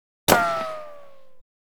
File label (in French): Balle ricochet; BRUITAGES